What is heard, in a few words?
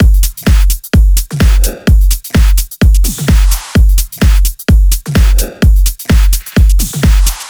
128BPM Kit; Vengeance Samples; Suisse Kit